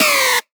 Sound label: synth screech oneshot E dry; Synth Hits; GhostHack